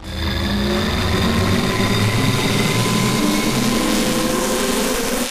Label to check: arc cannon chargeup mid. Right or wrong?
Right